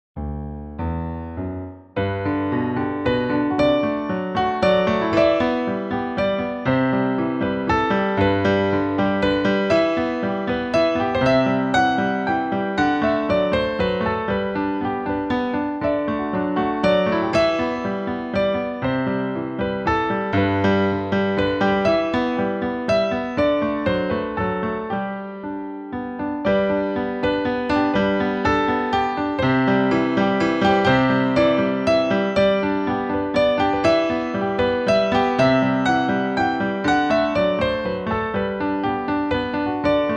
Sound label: Waltz Piano